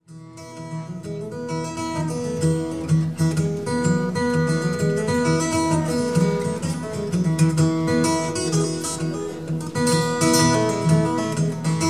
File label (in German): jig